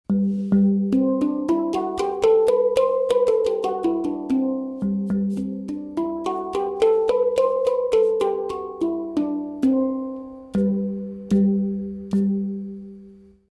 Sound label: Lydian, hang